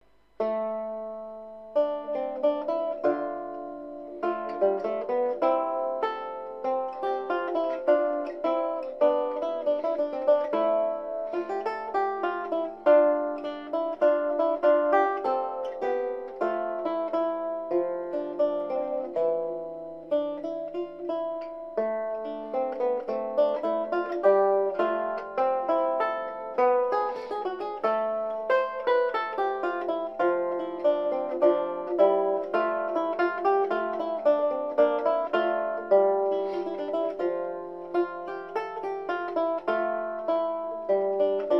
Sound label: lute Bicinia; psalm 5